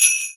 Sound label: xmas sleigh bells